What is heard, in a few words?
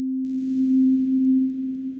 Pad, synth pad; Nausia Pad Split; The Forge by Hecq; Ableton; Factory Packs